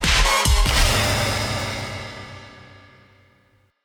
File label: victory; Grimrush